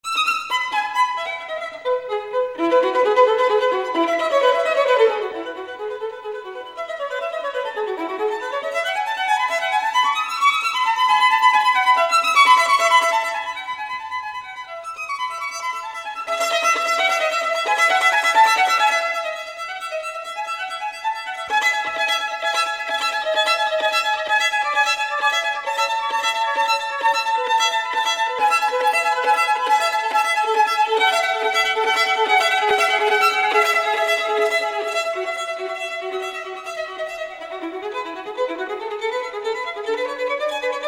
violin

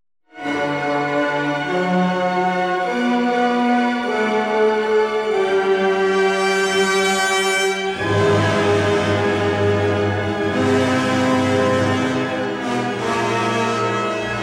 Bruckner Tuba

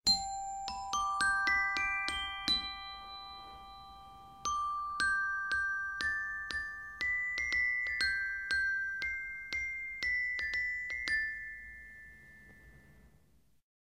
Glockenspiel

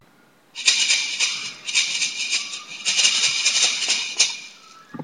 maracas

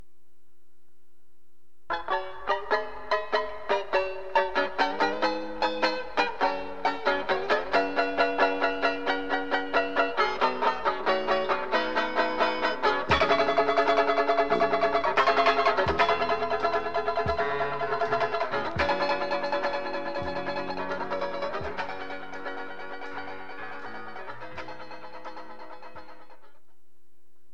banjo

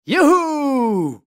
yohooo

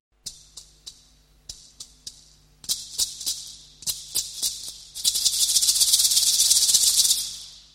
maracas

